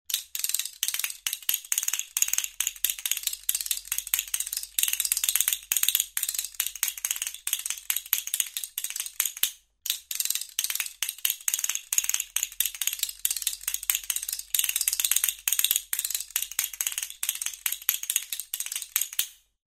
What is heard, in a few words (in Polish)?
Kastaniety; Przedszkole nr 1 Tuchola